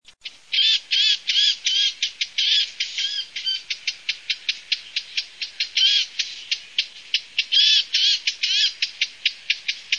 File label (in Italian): CAPINERA